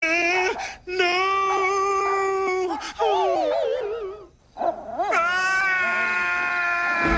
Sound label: a very noisy day and night; wimzies house